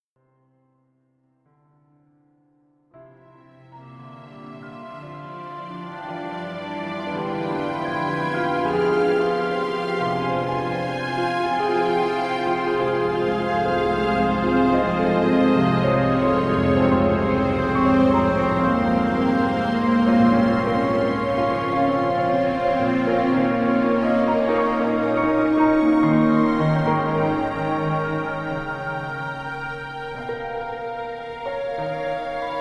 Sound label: Piano Concert